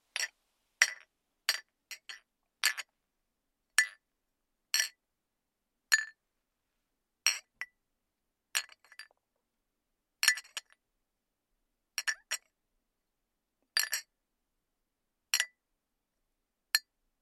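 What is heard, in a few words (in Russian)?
звуки чоканья на празднике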